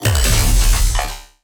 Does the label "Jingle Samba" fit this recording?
No